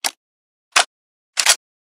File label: reload ak47; minecraft